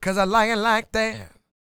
SING, Vocal Planet Blues Soul BLUESY

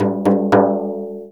INDIAN DR C#3, INDIAN PERC; Spectrasonics - Heart of Asia CD2

